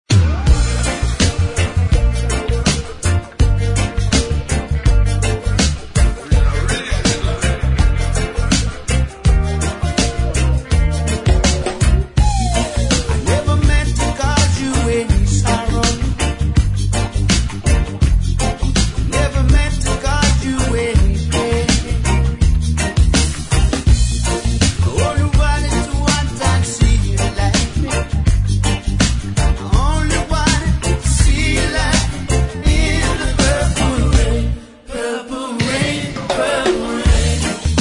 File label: Reggae